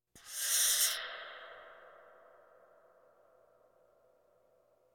Percussion Bongos Tympani rolls Gongs Drums

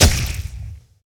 Enemy Attack; AudioWizards

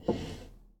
Foosball Goalie Slide

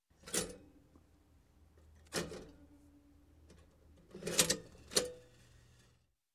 Toaster Put In Bread, Push Down; Sound Ideas 2000 Series

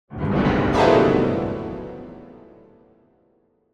Piano Fast Ascending Tension - Medium; Hollywood Edge; sound designer's toolkit v2

